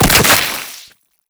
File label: antlion leg dismember